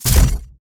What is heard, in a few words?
rebound